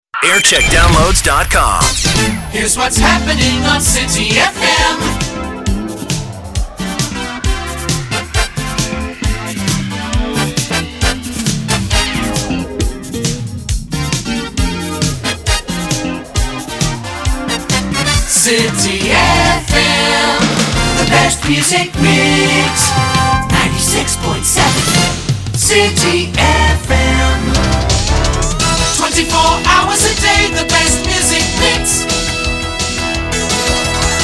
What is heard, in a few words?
jingle montage; city fm